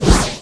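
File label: poison FAIL, magic; The Elder Scrolls III - Morrowind; Morrowind GOTY GOG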